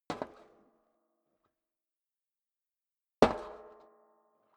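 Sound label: step boots metal rattle single left, footsteps; schritte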